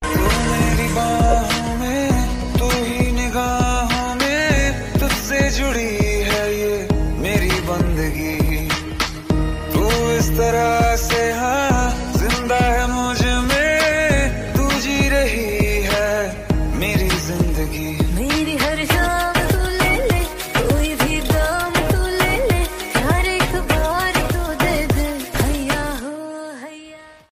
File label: sad ringtone, bollywood ringtones; love status